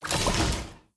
Occupy Upgrade; Conquest; blue archive

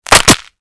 Break; blue archive

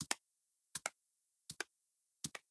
Foot Step; blue archive

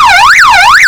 yelp, sirens; west mids

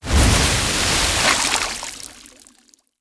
Water Shot; blue archive

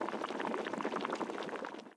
Cook; blue archive

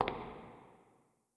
Foot Step; blue archive

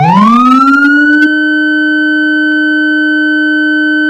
manual, sirens; federal sig rumbler

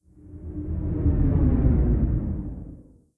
Wheelchair; blue archive

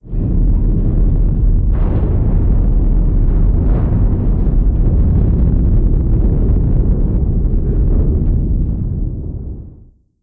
Boom Effect; blue archive

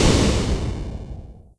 Retro Boom; blue archive

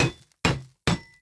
Beat; blue archive

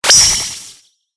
Eat Magic; blue archive

